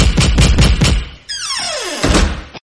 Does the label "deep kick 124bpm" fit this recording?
No